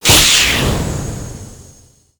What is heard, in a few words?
jump board; sonic